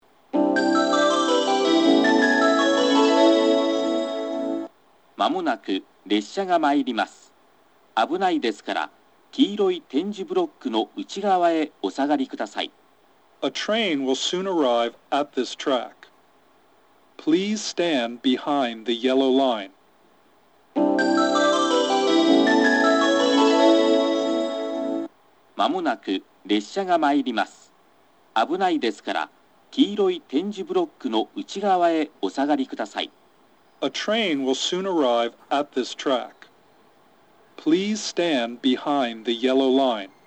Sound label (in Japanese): 由布院, 上り列車接近メロディ＋放送（男声）; スピーカーはTOA防滴型、FPS平面波、ソノコラムがありますが、設置数は少なく、気動車の騒音が入りやすいです。放送は発着するのりば関係なく、全てのホームのスピーカーから流れます。音質上、収録はソノコムラムとTOA防滴型で行っています。; 1 日田・博多方面 / 大分方面